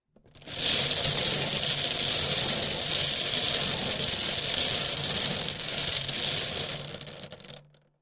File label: Spin the bingo cage; board game casino mahjong sound effects